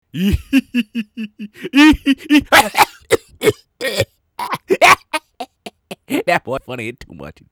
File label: LAUGH COUGH; HipHop Vox